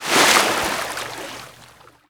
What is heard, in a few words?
zodiac splash big rear; veh zodiac splash big rear; Modern Warfare